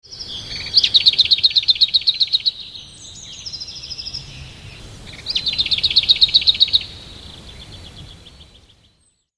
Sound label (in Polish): piegża, dwie piosenki prawdziwej piegży; wroblowe; zapozyczenia